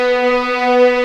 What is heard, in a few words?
B3 LEG, String Collection; East West; The Ultimate String Collection